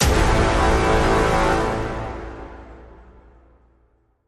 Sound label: Stab Big Brass